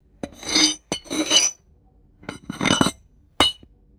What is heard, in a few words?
light gritty ceramic scrape; glass